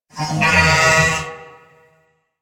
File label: Ram Ambient Call; Animals; DE; Warframe; Quests; Duviri